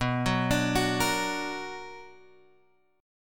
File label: B Minor Major 7th, Guitar, Drop D